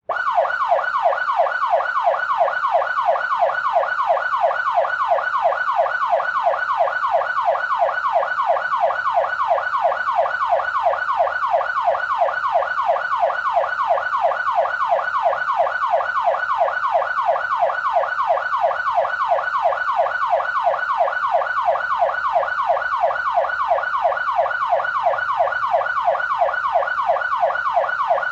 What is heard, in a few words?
Emergency Siren Police Yelp In City From Behind; emergency effects; AdobeCC